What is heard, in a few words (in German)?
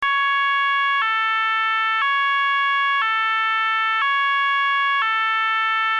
ton, Signalgeraete